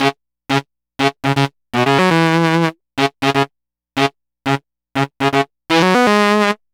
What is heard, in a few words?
Sax Line; Dr. Fink's Funk Factory